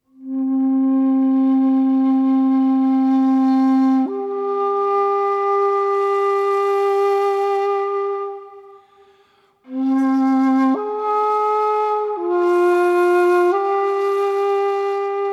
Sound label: Shakuhachi; Das Leben der Bienen Teaser